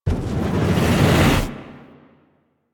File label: Operator Focus Blast Fireball Charge; Warframe; Operators; Powers; Attack